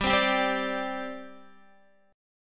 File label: G Suspended 4th, Guitar, Gsus4 chord; strummed; JGuitar